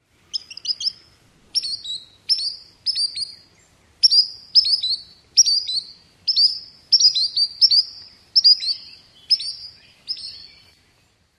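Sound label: solitary sandpiper calls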